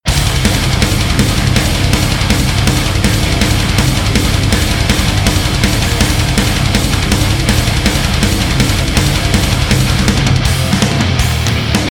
intro music solo; true admin

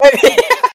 ehahaha; freak fortress 2; ze4

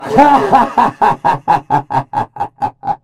teleport; freak fortress 2; blightcaller awakened

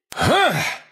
superjump; florentino

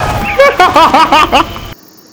superjump; freak fortress 2; legend laugher

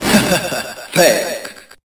ahaha fake

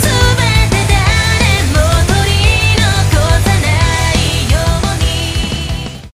outtro music; freak fortress 2

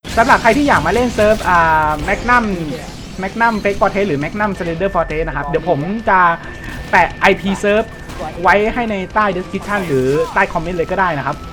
win

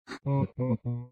zombie cry; freak fortress 2; employee

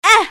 stabbed; freak fortress 2; isaac tboi

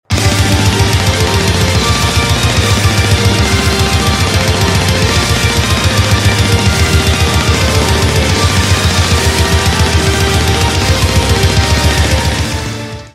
intro music; gearmaster

